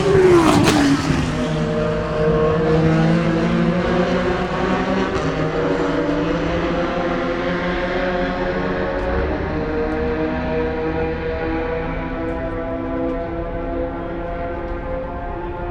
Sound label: aston martin before tertre rouge sunday